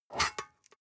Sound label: storage lid slide open hard; objekte